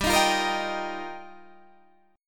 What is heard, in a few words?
G Minor 7th Sharp 5th, Guitar, Standard; Gm7#5; strummed; JGuitar; 15,18,15,15,16,15